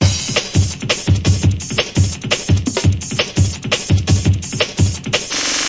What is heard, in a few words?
pitchy dnb, drum loops and breaks, 169 bpm; 161 to 180 bpm